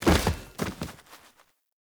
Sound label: Battle Dog Bodyfall Dirt, Foley